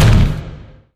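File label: laser emitter impact large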